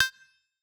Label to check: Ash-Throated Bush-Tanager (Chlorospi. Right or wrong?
Wrong